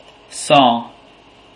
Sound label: saw